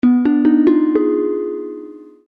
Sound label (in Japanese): hatena